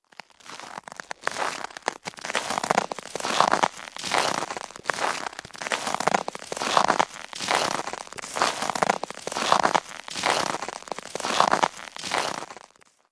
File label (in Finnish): askeleet lumella